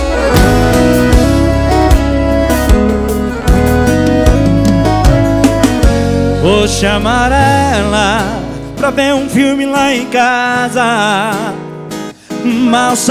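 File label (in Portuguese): mega funk mix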